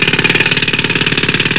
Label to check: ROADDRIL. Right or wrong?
Right